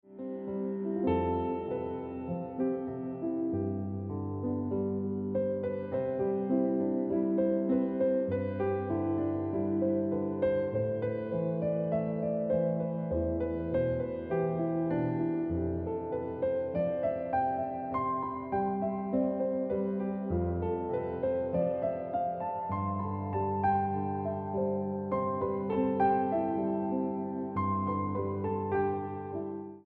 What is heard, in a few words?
Piano Music